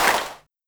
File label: Simmons Clap; Drums